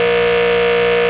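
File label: engine exhale, advanced engine sounds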